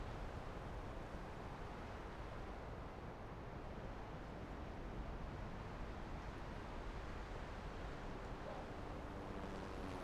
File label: bg mirage; ui